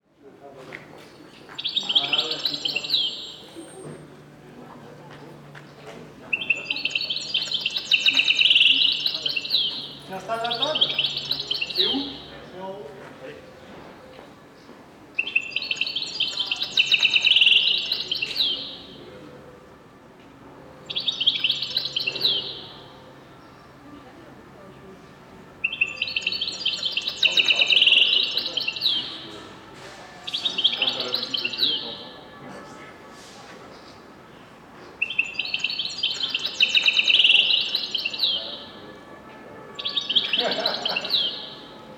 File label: pad